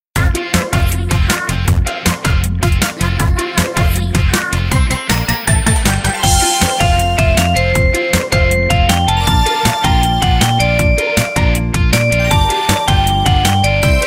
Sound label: karaoke